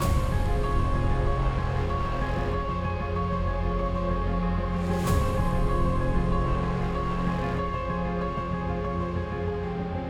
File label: Ui Story Main